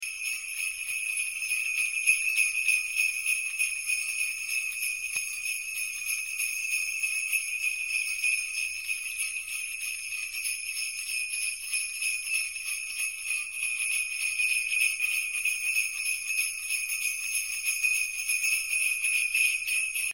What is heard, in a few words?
christmas bells